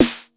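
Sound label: 909 snare drum, TR-909; Crypton - DrumBase5000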